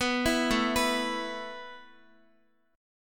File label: A Suspended 2nd A Guitar; Asus2; arpeggiated; x,x,9,9,9,6